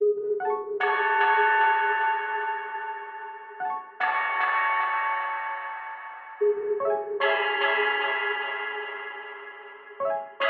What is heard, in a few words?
150 BPM C# Min Spring Guitar; Cymatics; Code Of Honor